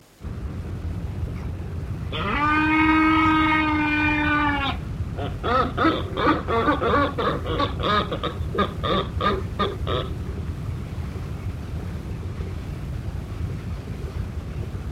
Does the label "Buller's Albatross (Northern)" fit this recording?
Yes